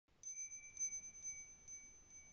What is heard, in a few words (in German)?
Klangspiel Malachit